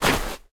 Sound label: sand, step; Minecraft; Votive World